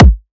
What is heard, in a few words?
Riddim Kick